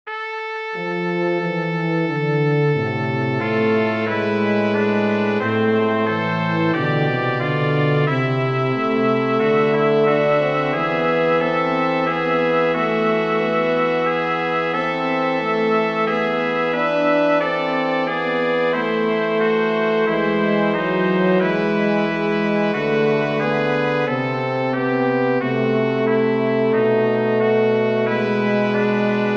for brass quartet; bach johann sebastian; prelude auf meinen lieben gott

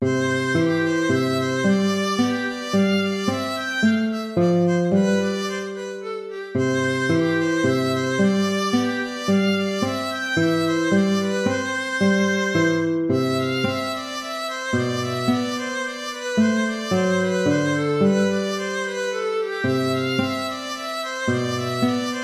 tempo minuetto; hook james

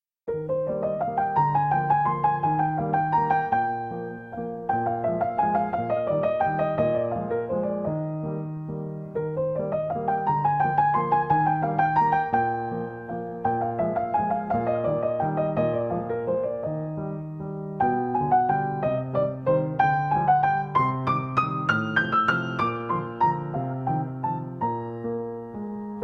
waltz

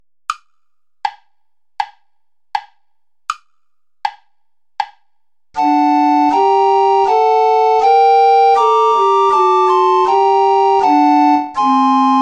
play along without tenor recorder

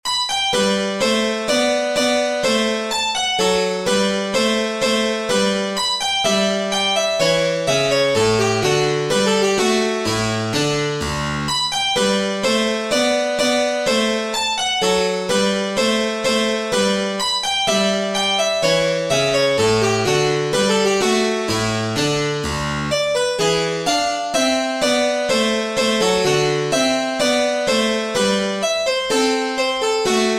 minuet G major

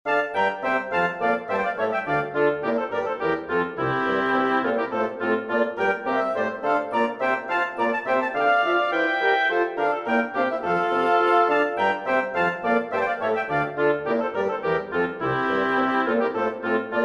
bourra for winds strings; haendel georg friedrich; from the fireworks suite

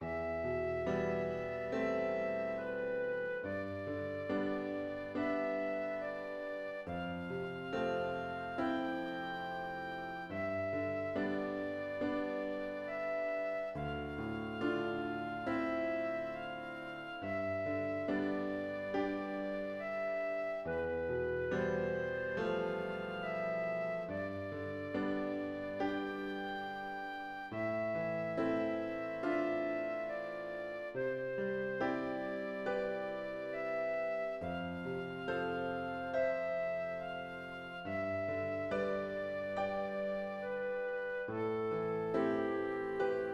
song without words for flute piano; schmidt julius

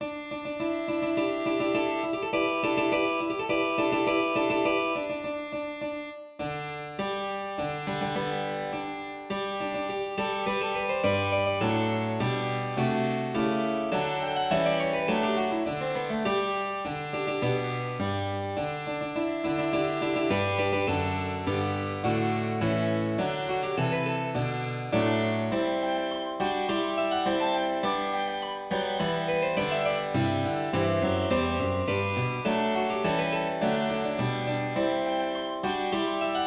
hymne national australie; mccormick peter dodds; advance australia fair

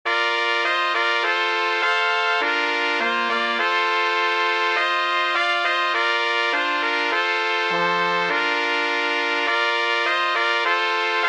trumpet gavotta

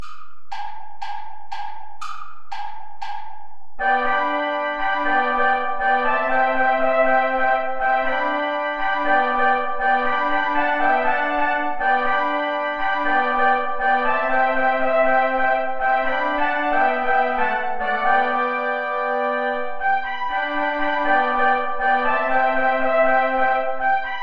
ipharadisi play along without trombone; traditional

